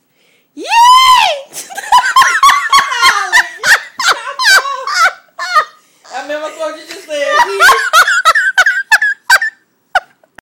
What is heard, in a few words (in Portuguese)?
risada